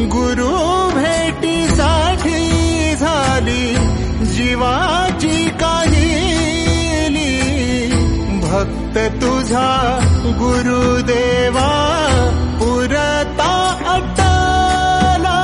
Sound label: Marathi Ringtone; Guru Purnima; Dharmveer; Mobcup